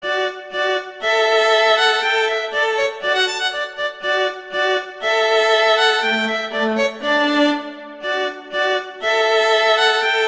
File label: Duet; Mozart; Duets; Vla